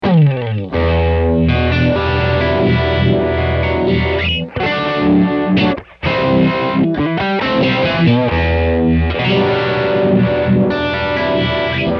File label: vibe; mobius